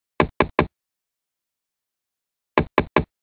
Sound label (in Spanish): tocando puertas efecto de sonido